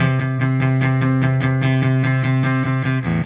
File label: Silos Clean Strum Guitar, Indie Rock